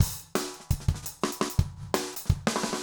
jungle, Ableton Drum Loops

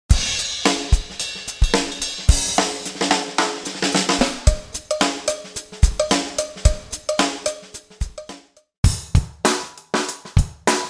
old skool funk

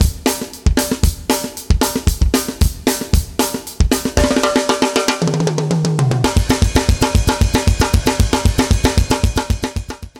very metal

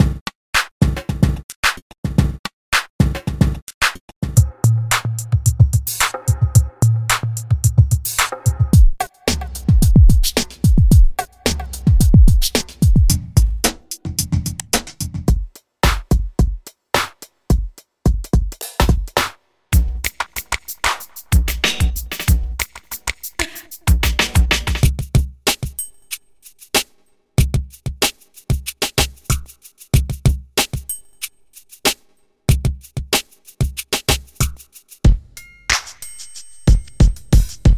beats 4 rhymes

